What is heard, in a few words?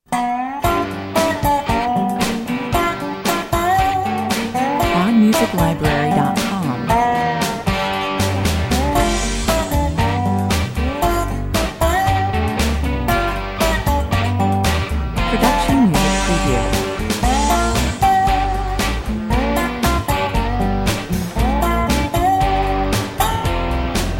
Driving mid-tempo country blues rock. Dobro top line & electric guitar solo, 116 bpm